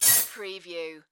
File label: Bell Swell Filter Warble